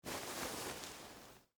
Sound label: Dress Shirt Pick Up, Foley, Clothes, Dress, Shirt, Pick, Up; Dressing; Human; BlastWave